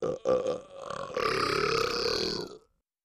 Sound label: Nice Dbl Toned Nast, belching